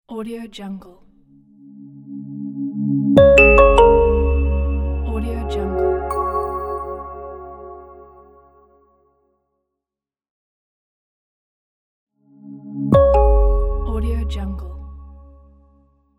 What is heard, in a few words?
simply logo